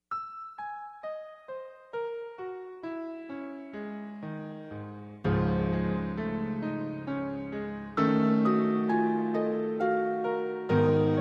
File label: Wind Branches Harp